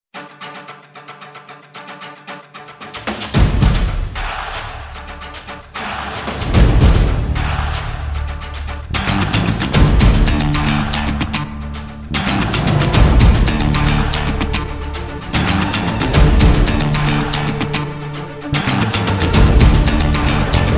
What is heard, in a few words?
Theme - Instrumental; Kaali Theme; Petta (2018)